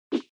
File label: switch lane; Subway Surfers